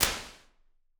Early Reflections Studio B Far L, Impulse Responses; Ableton Live 12 Lite